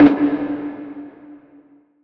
tom reverbed; Percs

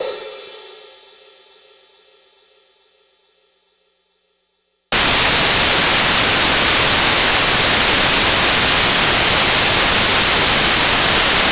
Open Hat

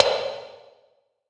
percussion wavey

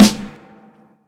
Snares

